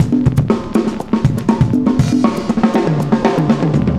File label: cooling 120, loops, 120bpm